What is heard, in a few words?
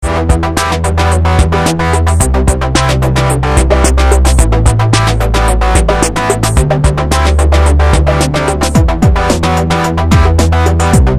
warp loop